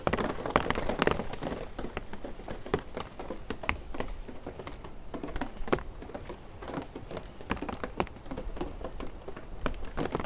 Rain drop taps on large firm leaves; boom library; Thunder And Rain

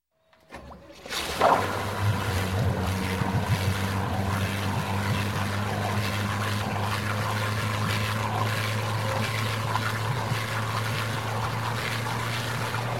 Boat Inboard - Start, Idle, Stop, Marine; Sound Ideas; Series 2000

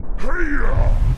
Punch Right Speed Up; Pitch Black - A Detective's Demise

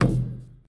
Street Ball Wood Back; SFX Kit; Street Basketball

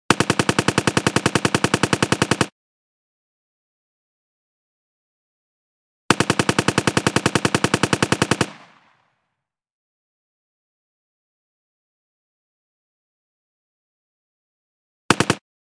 M-14 Simulatio, 3 Shot Burst; Hollywood Edge; Heavily Armed

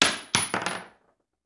Bullet Shell; Sound Ideas; Guns

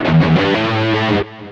Pickup Guitar Riff; SFX Kit; Pickups

